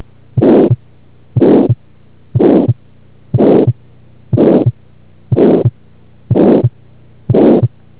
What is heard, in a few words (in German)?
herzklappenfehler aortenstenose